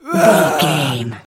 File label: voice male groan